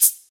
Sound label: shaker d key, shaker sound sample tuned to the D note. Loudest frequency: 9504Hz